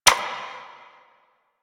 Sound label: Ammo Menu Hover; Ammo Ability; DE; Warframe; Frumentarius